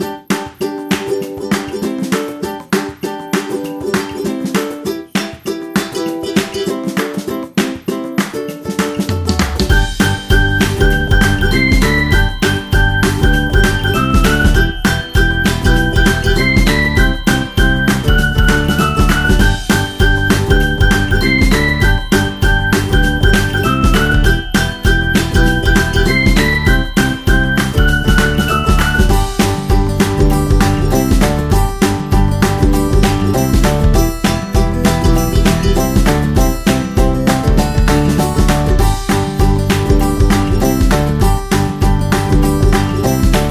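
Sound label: Happy Kids Fun, Kids background music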